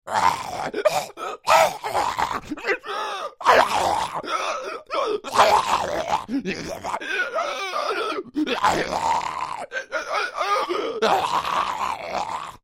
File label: Creature Troll Yelps Pain Distress, Monster; Monsters (Sound Effects:Horror); Big Room Sound